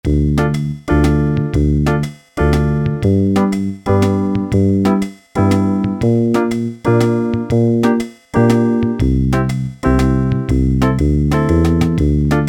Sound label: JAZZ WALTZ, Yamaha SHS-10; Factory style demos